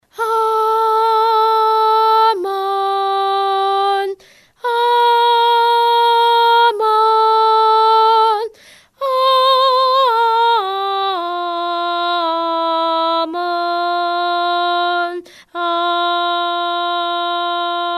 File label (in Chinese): 四叠阿们人声